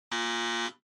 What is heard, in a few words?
Electromagnetic Buzzer, Dry